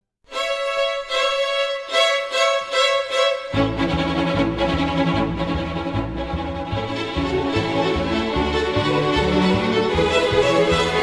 String Ensemble

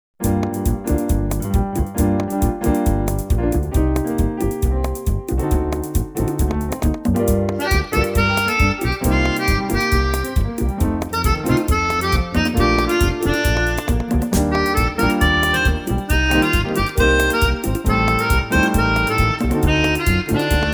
samba salsa; live band

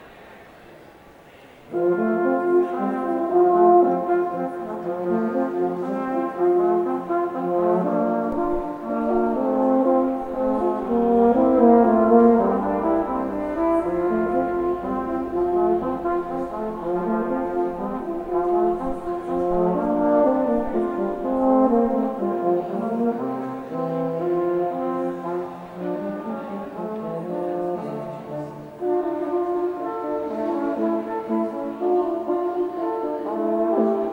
Brass Duo Chamber Music; Classical; Hickory Brass

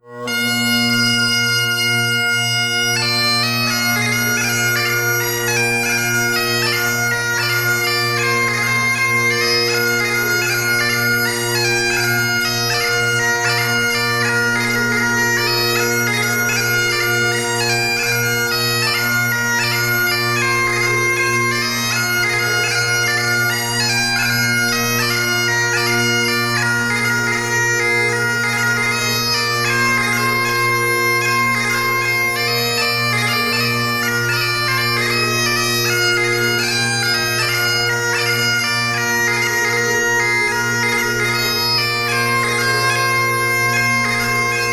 6 8 Marches, bagpipes

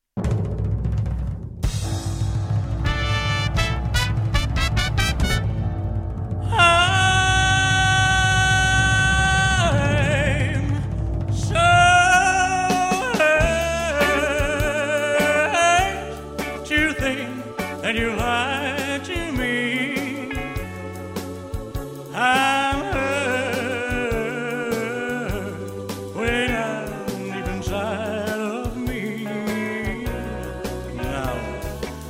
Impersonator; Elvis Impersonator

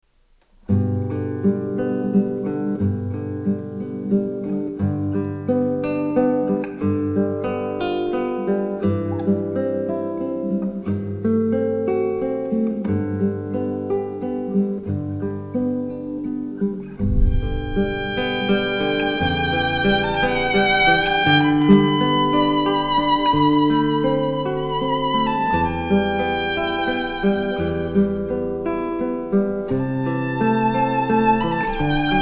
guitar violin; ave maria schubert

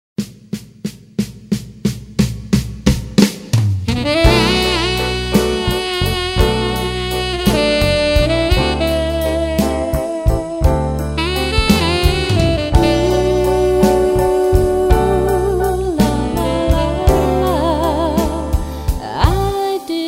jazz band; LP Trio

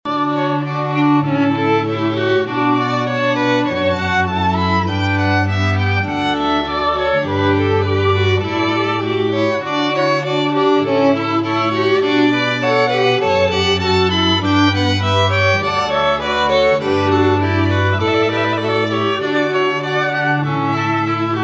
Canon in D, String Quartet; J. Pachelbel; Dolce Vita Strings

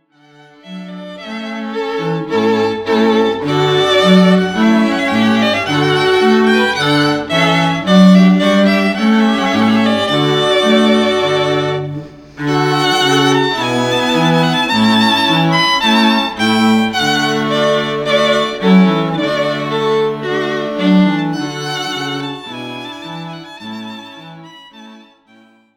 Classical, String Quartet

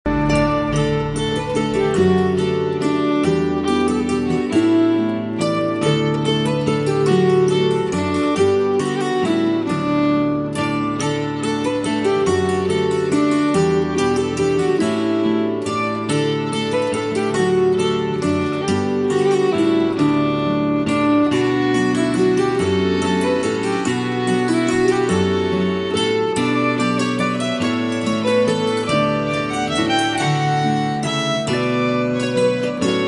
Waltz, Celtic Duo

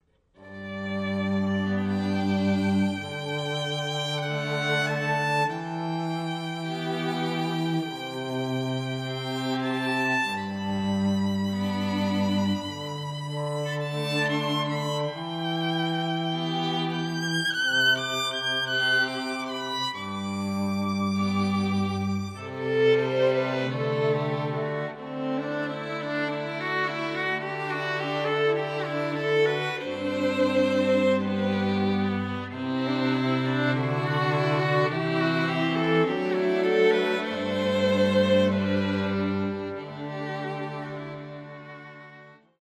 string trio

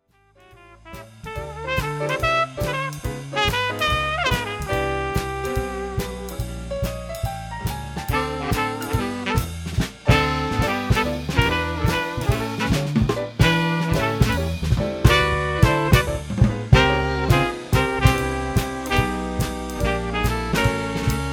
Combo, Swing Band

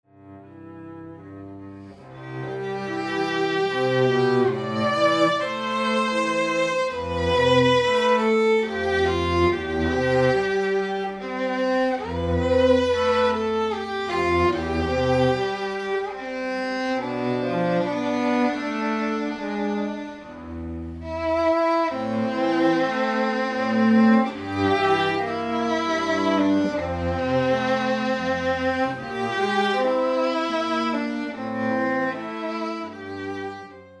Duo, String Quartet

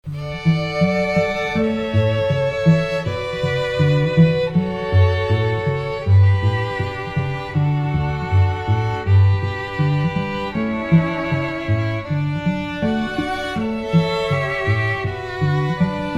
Canon in D, Classical Trio; Pachelbels

